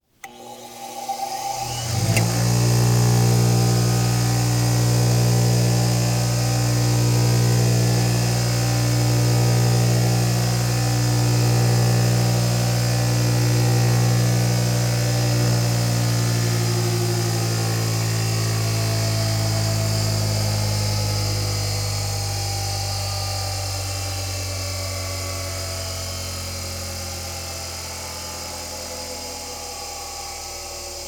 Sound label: Metal Grinding Machine; Tools and machines